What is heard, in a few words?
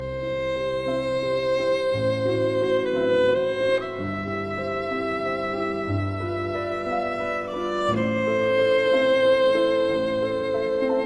ave maria violin